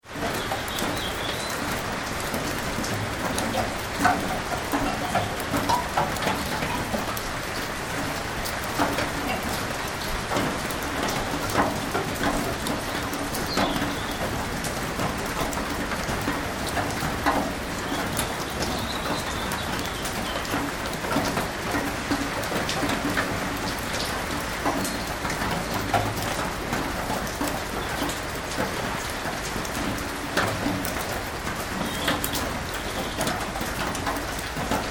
Rain onto Metal Shed Roof, Steady rain with big drips; Weather